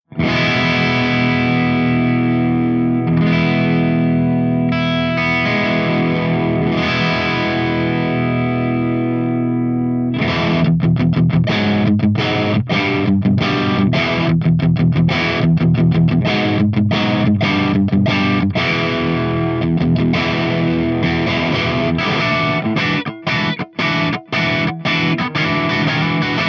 ENGL CH2 BRIGHT V30 P90; ENGL SP

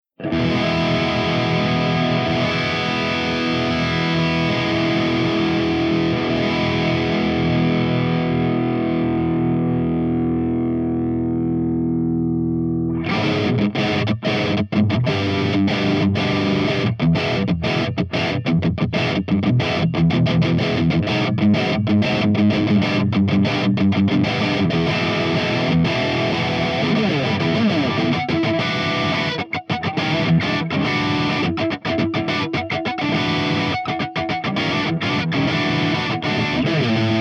ENGL SOV CH4 LEAD V30

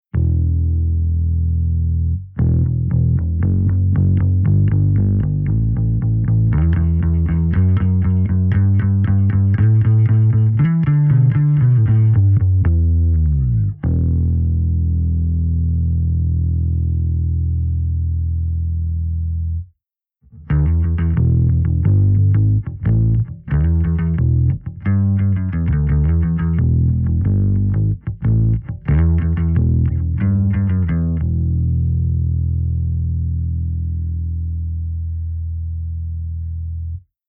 ASH VALVE DRIVE

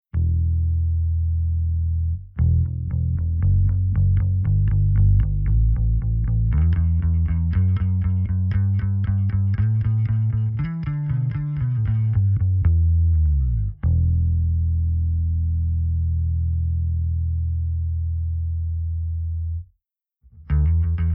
BETA52

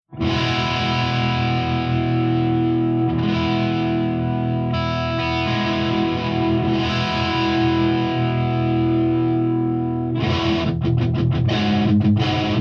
ENGL ROOM

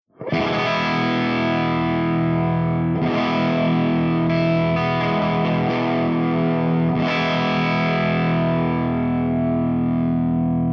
MESA STUDIO CRUNCH V30 HB

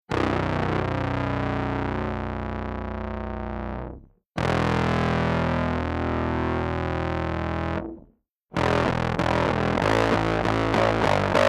BIG MUFF

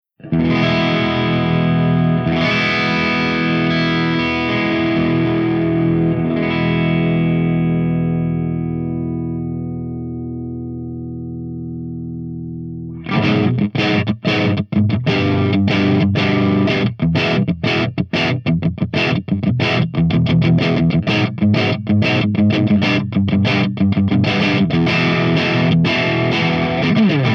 ENGLSP CH2BRIGHT V30 SC

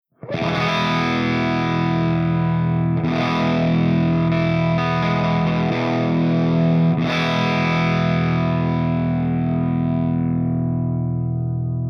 MARSHALL JCM800 CH1 HIGH GAIN HB

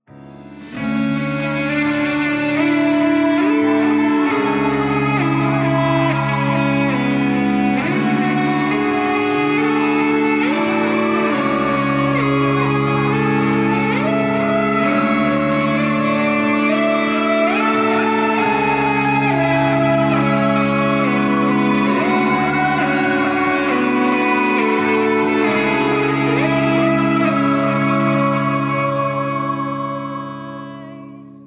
EBOWS 4TET

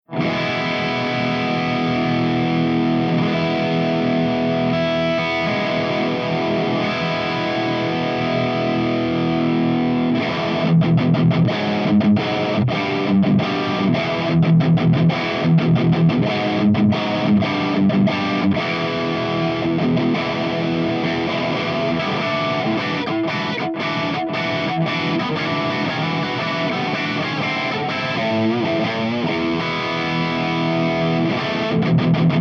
BLACKSTAR HT CH3 USA V30 P90

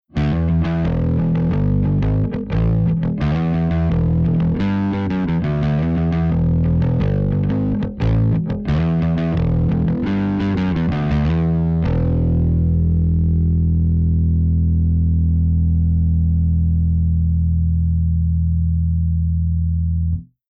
PSA1 STANDARD

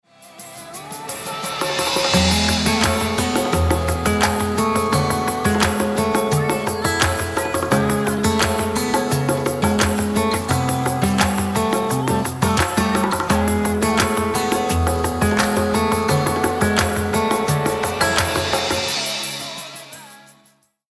SOUL

